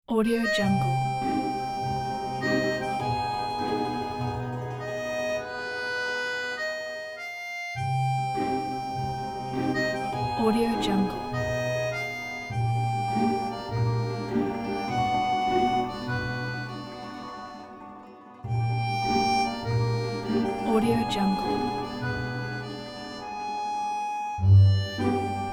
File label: parisian cafe